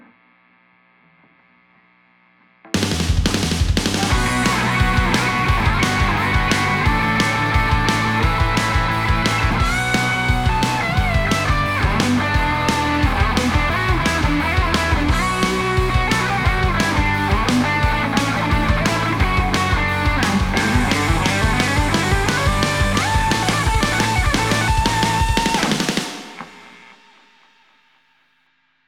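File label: guitar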